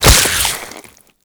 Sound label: Infested Face Hugger Latch; Warframe